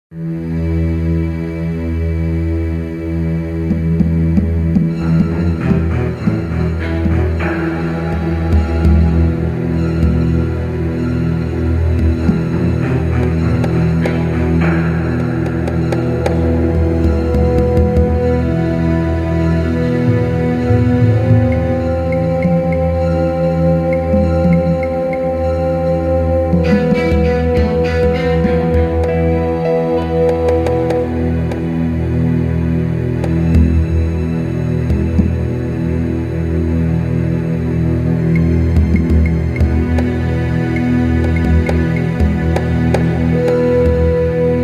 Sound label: slow, music; Soldier Of Fortune II Double Helix; XBOX; finca